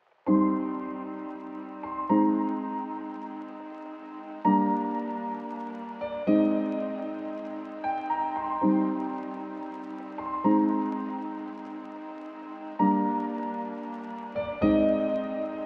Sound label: Instrumental Lo-Fi